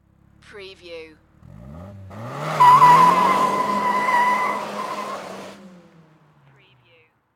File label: NISSAN SILVIA EXHAUST TAKEOFF